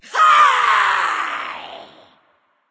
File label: battle cry; smite